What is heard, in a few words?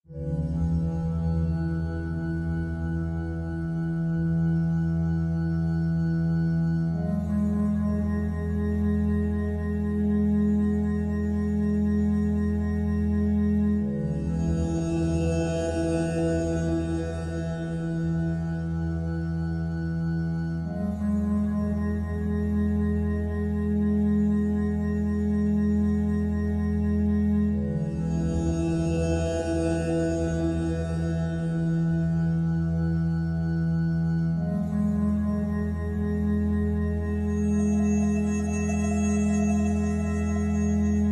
Drone, Light